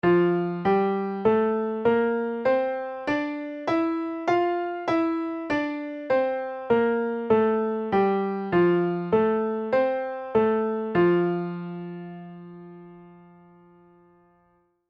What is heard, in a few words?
tune up F Major